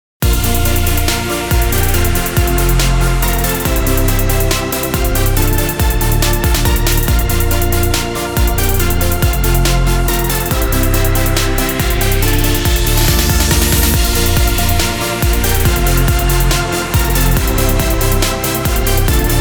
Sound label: (INSTRUMENTAL)